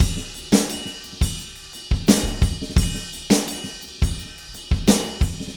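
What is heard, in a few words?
86 bpm, beat loops; Zero-G; Monster Beats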